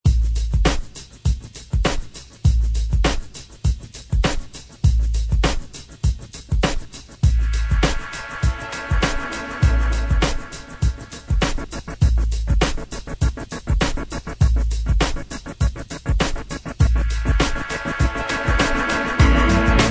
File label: 90s Rock; DJDOLLS